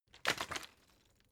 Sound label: bottle water sparse shake single soft; berufe